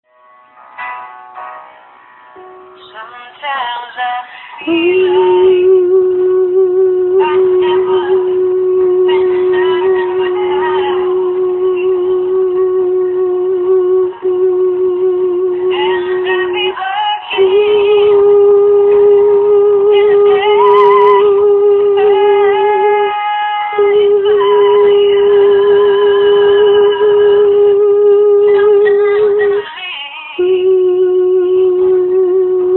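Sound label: soprano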